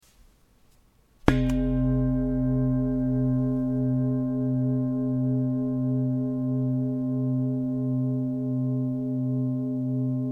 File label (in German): Klangschale